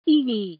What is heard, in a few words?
mmmmm